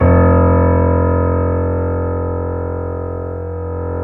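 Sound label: keys, YC7 piano pp; Rhythm Section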